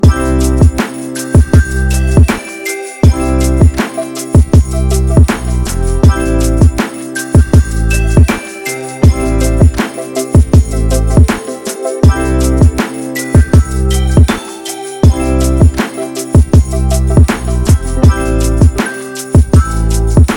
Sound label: christmas hip hop beat